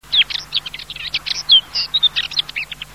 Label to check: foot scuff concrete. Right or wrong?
Wrong